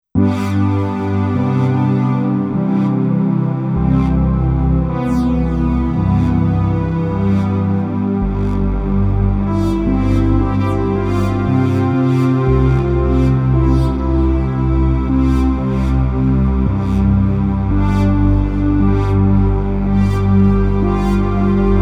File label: DM12